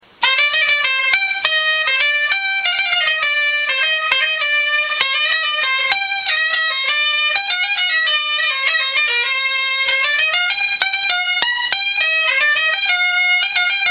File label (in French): bombarde; instruments